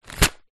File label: foley tent pegs in pouch down on surface